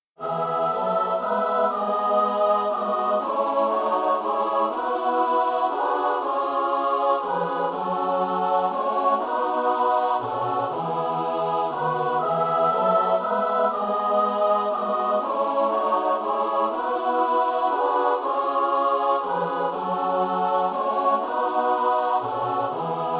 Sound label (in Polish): midi lauda sion